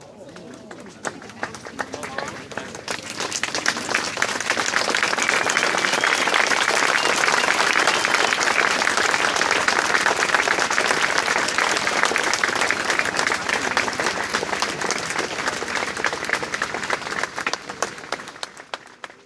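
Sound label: applause; Mafie 1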